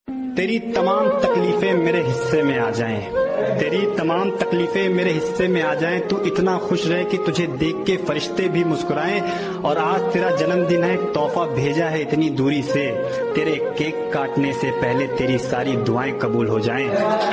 happy birthday jaan punjabi

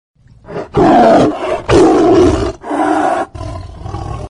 tiger roar

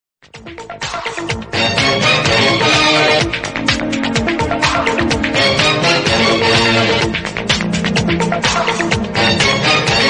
bgm, tamil; cbi

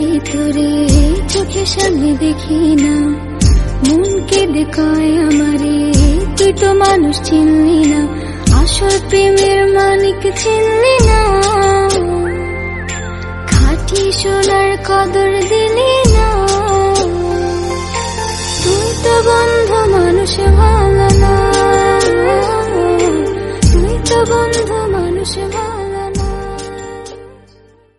bengali sad

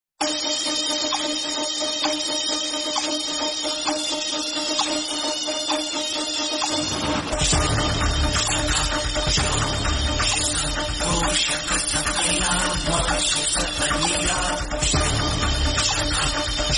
telugu bgms; aparichit

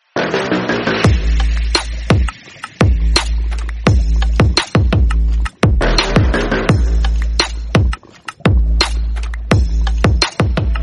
minecraft remix

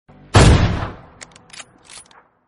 sniper gun sound; pubg

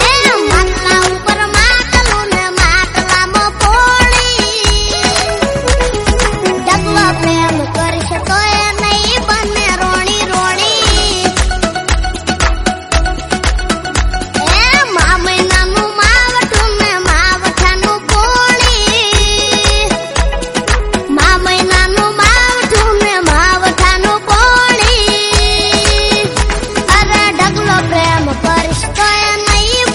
gujrati song

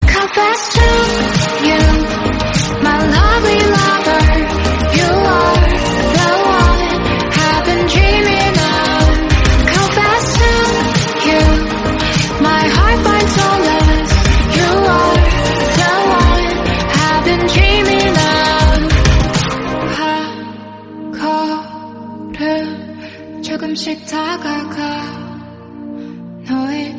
korean song

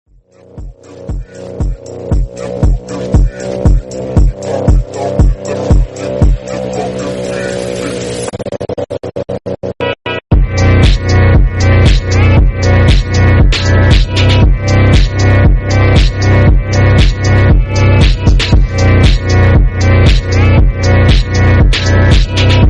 instrumental

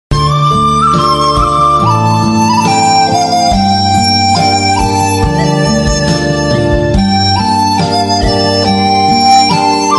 japanese bamboo flute music bgm

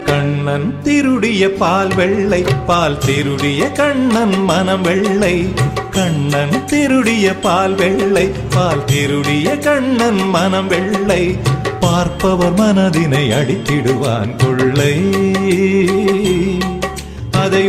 tamil devotional

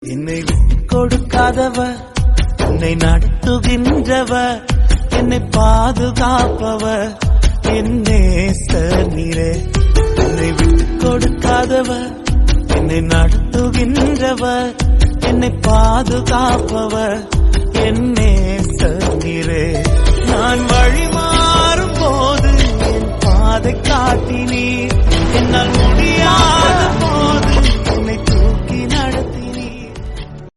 jesus devotional

